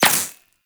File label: Laser Impact Default; Warframe; Weapons; Bullet Impacts